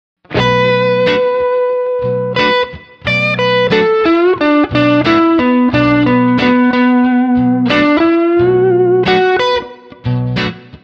Pentatonica

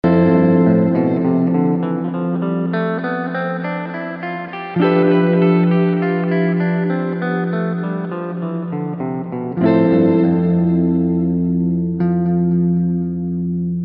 Modos Gregos Fa Lidio

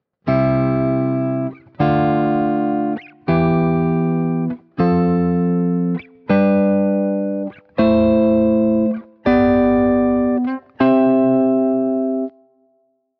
triades abertas harmonico

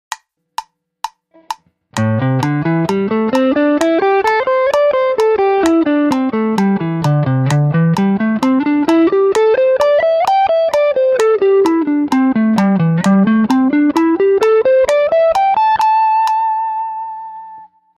Penta Fluencia 130bpm

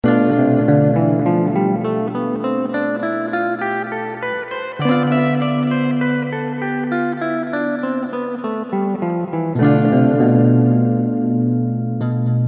Modos Gregos Si Locrio

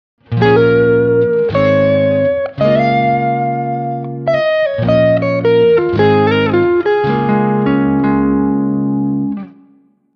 Pentatonica

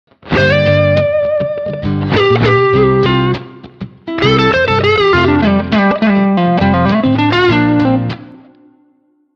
Pentatonica

